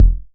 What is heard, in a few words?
909 LO KIK SFT